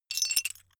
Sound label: metal pieces clatter short